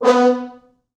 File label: Fr Horns stac